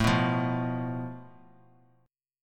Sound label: A Suspended 2nd Sharp 5th; strummed; JGuitar